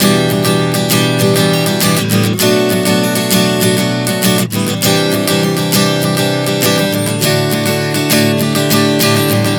Em7-Bm7-Cadd9-D 100 bpm, acoustic guitar chord progressions